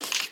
流浪者脚步声

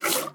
挤奶声; 哞菇

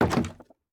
竹木门开关声

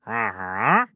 待机声; 流浪商人

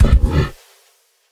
骆驼冲刺声

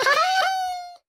豹猫死亡声

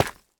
凝灰岩砖踩踏声

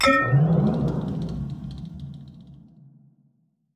重生锚充能声

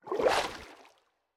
海龟游泳声

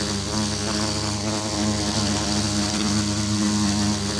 蜜蜂飞行循环声

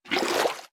水桶装水声

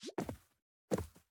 犰狳开始展开声

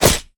狼甲受损声

